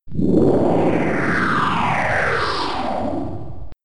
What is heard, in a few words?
Whoosh; SSV-Music